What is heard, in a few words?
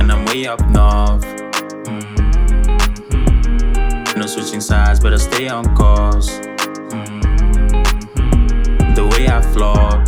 WET